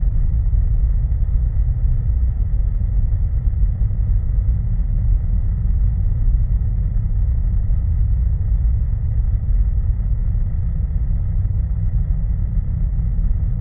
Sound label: titan ship hum; nemesis; Stellaris